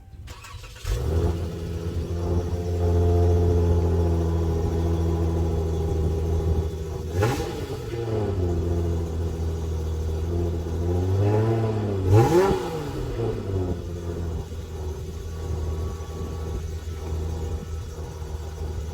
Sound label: dodge challenger